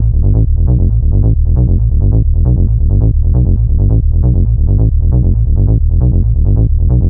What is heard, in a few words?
Bass Loop D 135, Techno; Ghosthack; AC2024 - Day 1 - Techno Sounds 2025